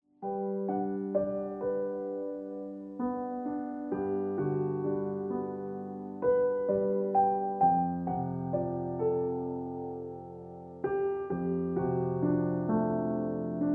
Ringtone Piano Music

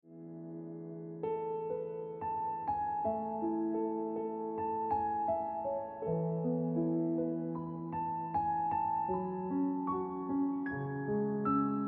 Ringtone

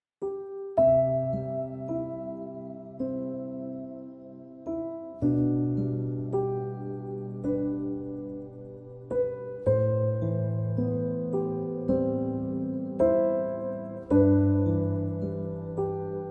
Ringtone Piano Music

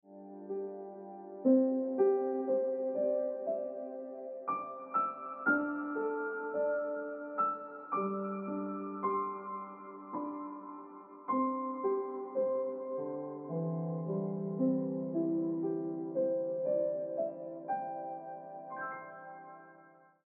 Ringtone Piano Music

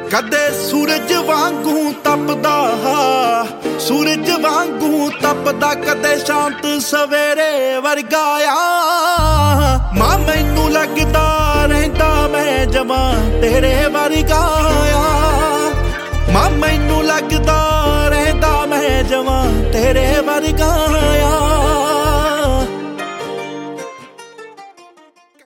Ringtone

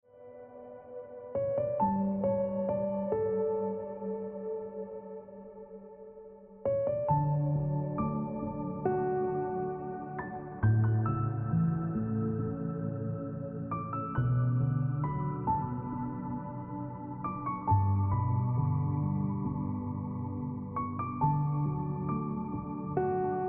Ringtone Piano Music

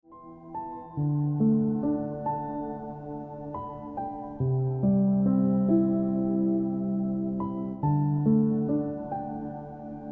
Piano Music

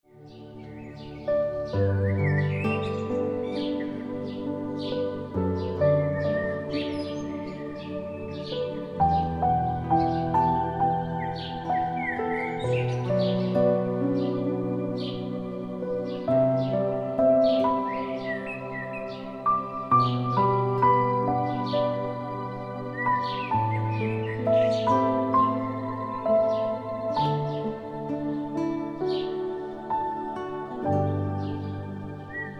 Piano Music

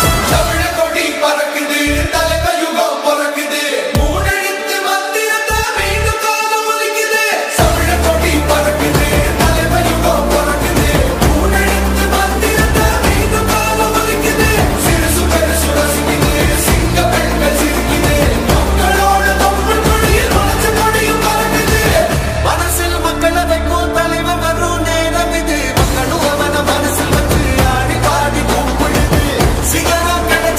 Flag Anthem Ringtone

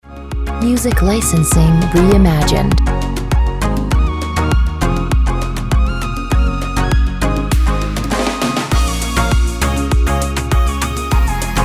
Beach Vibes Ringtone

